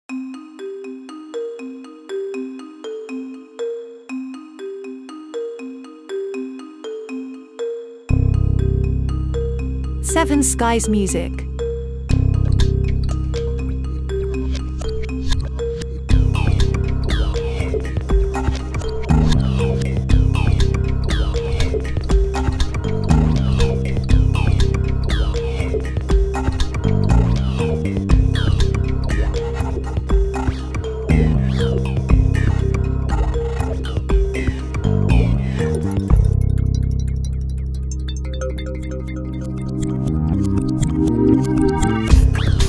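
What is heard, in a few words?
Electro acoustique calm intriguing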